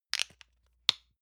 lotion lid open hard; friseur; berufe

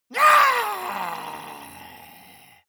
monster growl high; schreie

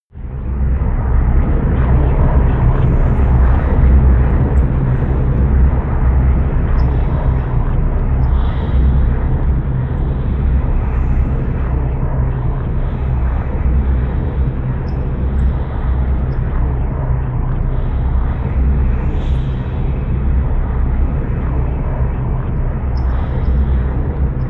drone storm low

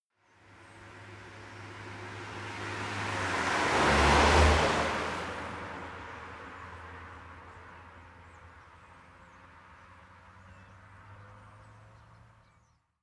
car drive by medium speed nature

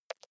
click bulgy

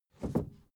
suv 1st gear release quick; verkehr transport; autos

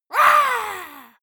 monster growl attack high; schreie

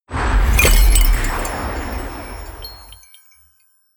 magic spell storm wind bells; swooshes-swishes

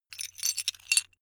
metal pieces clatter short

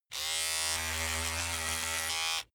razor on load short; maschinen; rasierer; soundpool

